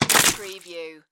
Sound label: Mud Hit